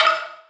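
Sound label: Percussion Fire Hit, Dancefloor Pop; Remixlive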